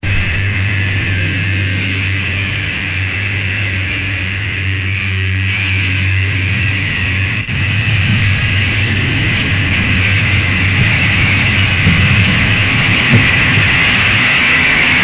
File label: a mixture of salamander, reptile